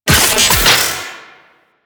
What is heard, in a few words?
Comet Shredder Iris Door Open; Warframe; Corpus; Railjack